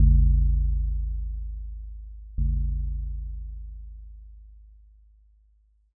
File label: joya bell